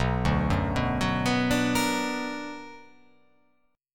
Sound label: B Minor Major 7th